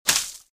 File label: Wet Explosion - Shorter; Kill Pill; Windows